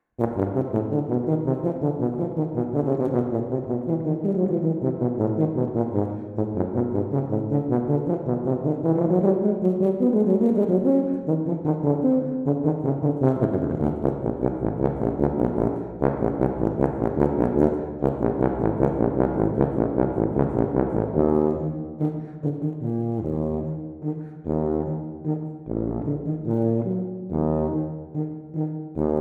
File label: tuba study